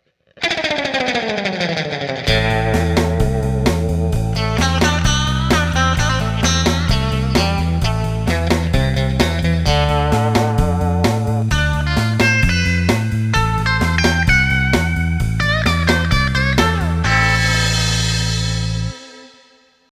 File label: surf stud